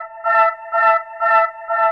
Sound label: house synth 125 A, keys